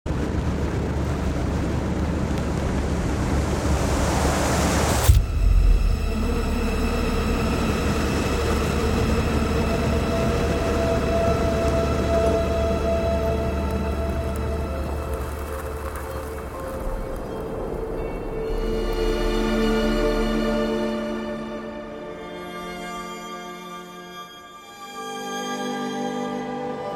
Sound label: tone